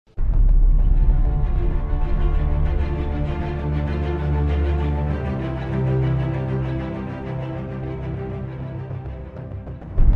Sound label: Epic Hollywood music intro